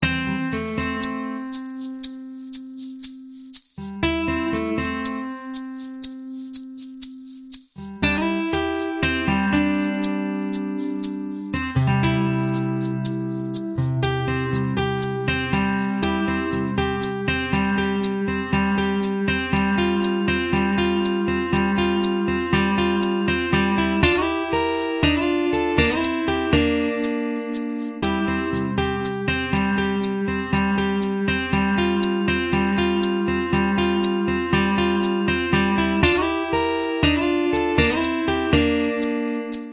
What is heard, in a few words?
Guitar Shaker; Windscape; Switch, Windows, Xbox One